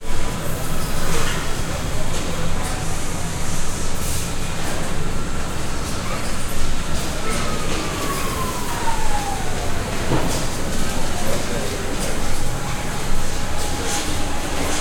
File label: Distant - Machine Hall, Ambiance, Big Motors; Double Trouble Audio